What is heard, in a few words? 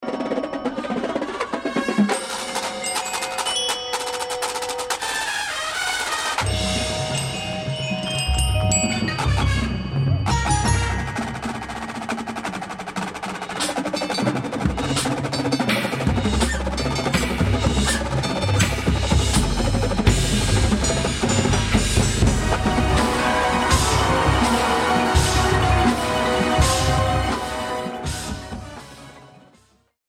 Snare drum from Switzerland